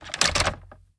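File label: Inspect Movement; Valorant; ares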